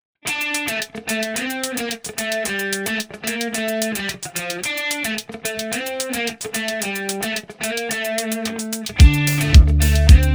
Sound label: instrumental; Сбор гостей